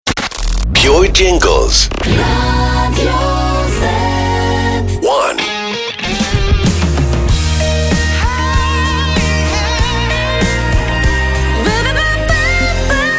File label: Jingles; PURE; Radio ZET